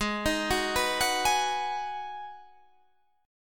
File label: B 6th G sharp, guitar chord